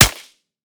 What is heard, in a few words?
val shoot; metrocraft; weapon